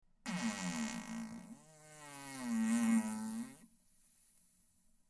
Encerrado, pedo

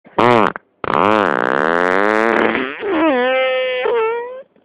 Ascensor

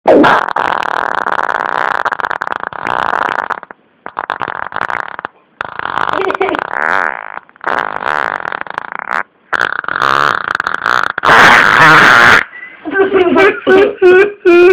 Saturado con risas, pedo

